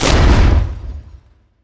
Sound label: Hit Med In, Damage; DCS